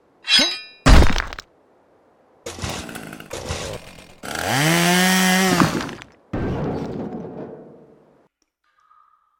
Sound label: Drill; The Henry Stickmin Collection